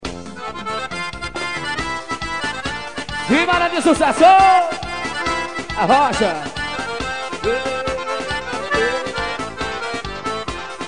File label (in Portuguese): acordeon